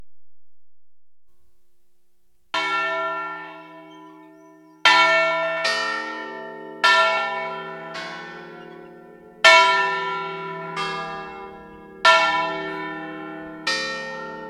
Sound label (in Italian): Campane; Teviggio; La Spezia; Archivio Balma Campane Liguria